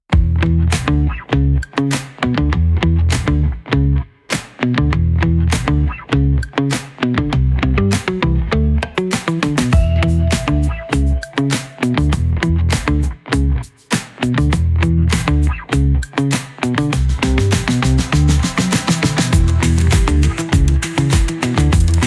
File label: Pop